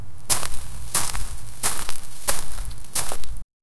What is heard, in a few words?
stepping on grass; landscape